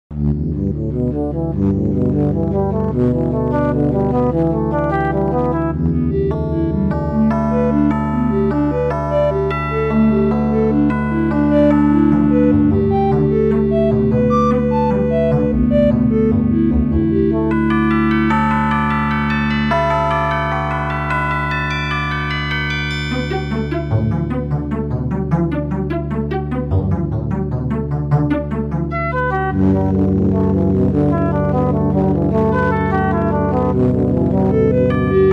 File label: Slendro scale with modulo arithmetic seeds